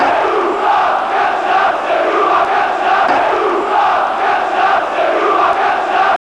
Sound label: OOh AAh